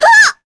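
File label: Vox Damage, Korean; voices; heroes; Sonia; kings raid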